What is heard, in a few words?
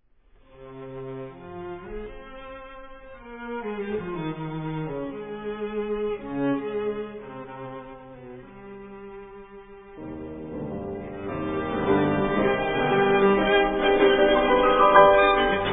Antonin Dvorak Piano Trio in F minor; Op 65